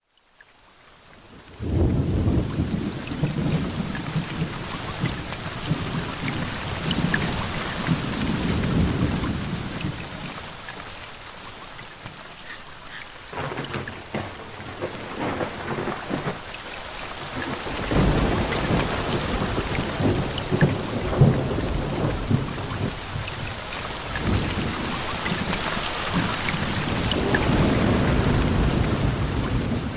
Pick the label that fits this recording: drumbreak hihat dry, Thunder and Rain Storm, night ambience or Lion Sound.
Thunder and Rain Storm